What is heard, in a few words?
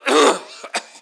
cough; worm, bf2142